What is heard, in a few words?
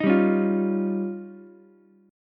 F 7th Suspended 2nd Sharp 5th, Ukulele; F7sus2#5; strummed; JGuitar